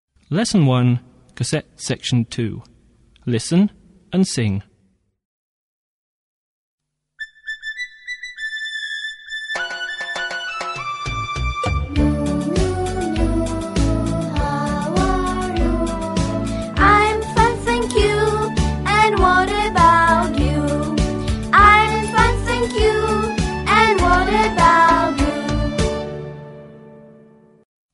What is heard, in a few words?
song meow, meow; BUSY BEE 2; Lesson 1: GREETINGS